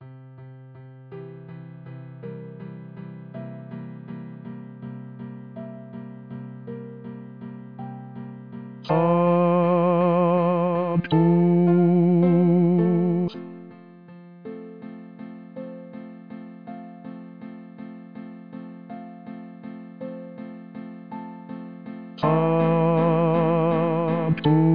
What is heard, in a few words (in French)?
Messe Fa Sanctus Basse; Shubert